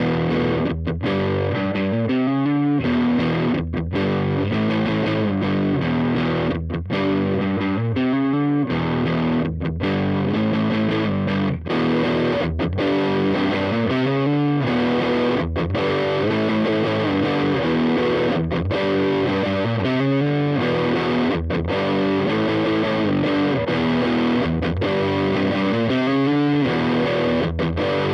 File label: Compadre Fender Gain mid boost studio kompressor